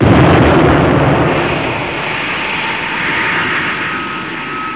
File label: MISSILE; WEAPONS